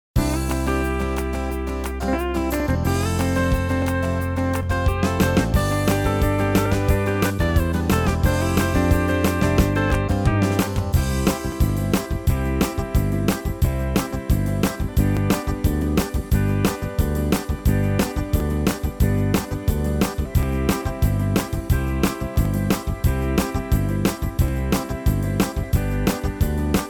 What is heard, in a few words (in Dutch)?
(karaoke) muziek; Bieb Almere